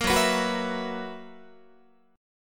Gb 11th, Guitar

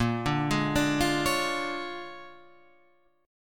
A sharp 7th Sharp 9th A sharp down Guitar Open F; A#7#9 arpeggiated; JGuitar

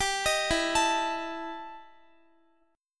E Minor Major 11th E Guitar; EmM11 arpeggiated; x,x,19,20,16,17

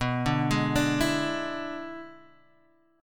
E 7th B, guitar

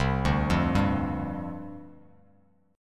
C Suspended 2nd Suspended 4th C Bass Standard; Csus2sus4 arpeggiated

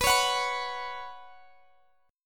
B Suspended 2nd, Guitar, Open D

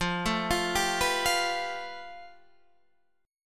A 7th Suspended 2nd Sharp 5th F, chord

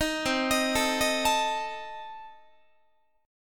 C Minor 6th Guitar, chord; Cm6 arpeggiated; 18,20,18,19,19,20

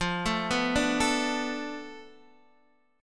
F 6th Flat 5th F down Guitar Open D, chord

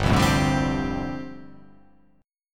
G 7th Suspended 2nd Suspended 4th

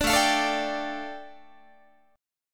A 7th Suspended 4th Sharp 5th A, Guitar

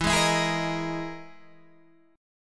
E Major Sharp 11th E

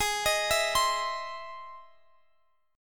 E Augmented Major 7th Ab

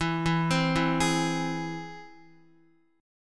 E Augmented, Guitar; arpeggiated

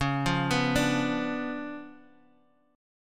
C sharp 7th Flat 9th Guitar Standard; arpeggiated; chord; 9,8,9,7,x,x

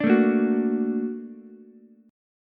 A sharp Augmented Major 7th A sharp Ukulele; strummed; JGuitar

